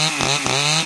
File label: engine, manhack; hl2